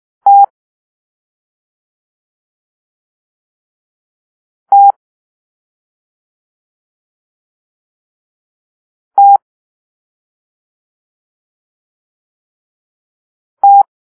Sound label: Tango (T), morse code